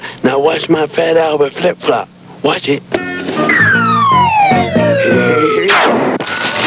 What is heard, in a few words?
flip flop